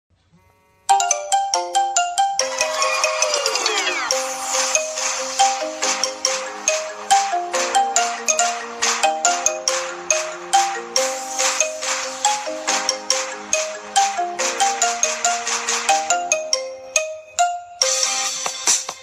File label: marimba ringtone